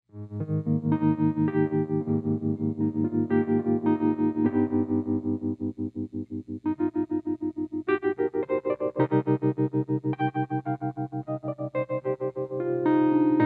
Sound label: Heavy Vibrato Twin, electric piano